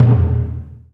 MED DRUM, JAPANESE

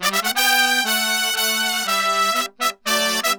MERENGUE

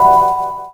Chords Tones; Equipped Music; Loungin House

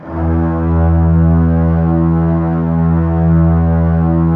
LOW VLNS E2; Q Up Arts - Denny Jaeger Master Violin Library

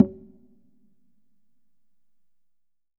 Congas Wet

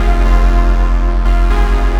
Synth Chords; Eclectic Electronica

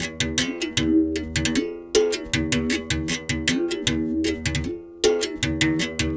Tar, World Percussion

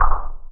ELE BD, Drums Dance & Groove; USB Soundscan vol.11; AKAI; ELEC. KIT

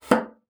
BASSOON; Xsample VOL-6

